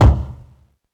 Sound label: mid heavy bass drum sample C key, steel kick drum tuned to the C note